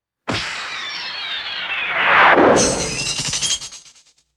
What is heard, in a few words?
descending cartoon whizz and metallic clong